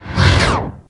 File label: Menu Scifi Enter; Menu FX